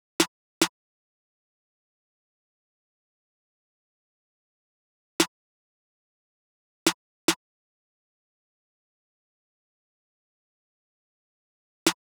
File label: Drum Loop 144 BPM Snare, Hip Hop; Drum Loops; Loop Stems; Cymatics; Late Drum Loop